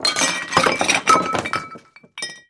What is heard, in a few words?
dishes clattering; DeadFright